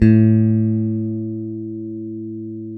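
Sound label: CLN STRAT, Synclavier, GUITARS; Sampler Library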